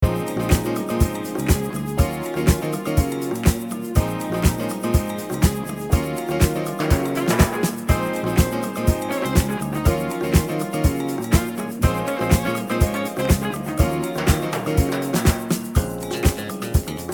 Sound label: Classic Disco Kit Mix